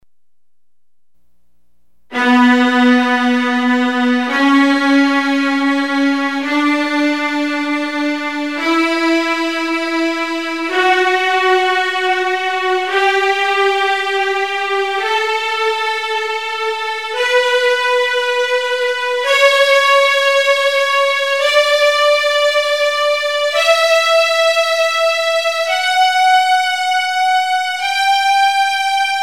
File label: Strings